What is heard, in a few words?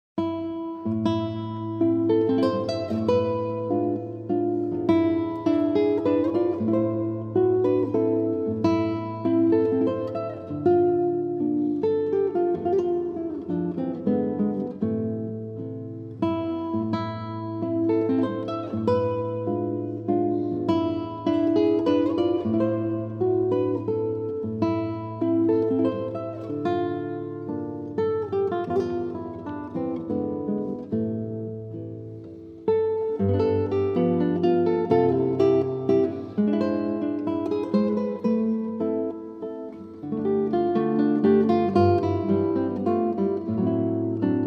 mazurka